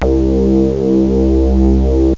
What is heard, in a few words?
Moog Low B2